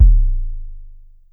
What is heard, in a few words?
Drum X Sub, Pearl Drum-X